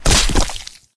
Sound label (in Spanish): caida